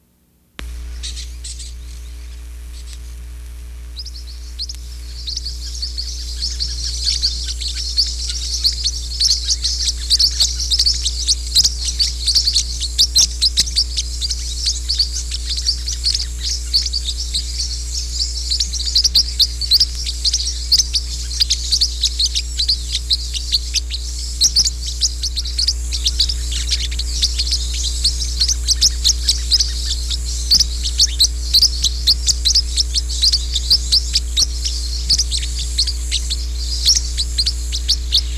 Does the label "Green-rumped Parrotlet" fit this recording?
Yes